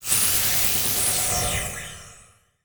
Title Transition Electrical Power Down; Adobe; Production Element

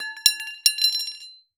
Horror Scary Pipe Metal Dropping On Cement

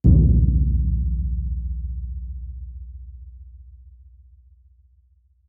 Cartoon Drum Bass

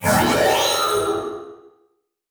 Multimedia Internet CD-Rom Flash Buttons Hard

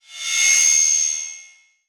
Multimedia Internet CD-Rom Flash High Pitch

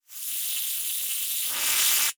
Transition Electrical Surge; Adobe; Production Element

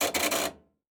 Washing Machine Knob, Household

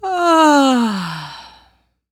Human Female Yawn

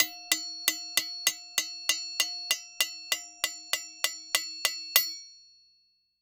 Emergency Alarm Old Fire House Alarm; emergency effects; AdobeCC

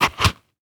Foley Footstep High Heels Dirt Debris Single Step; Foley Footsteps

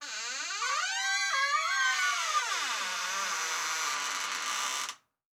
Door Squeaks; household

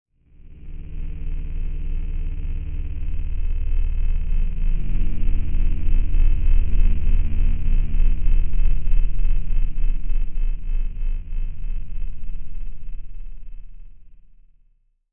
Title Transition Stab Dark Long; Production Element; AdobeCC

